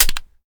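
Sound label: pistol 1911 reload mag in; gmod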